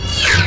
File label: darksaber swing; lightsaber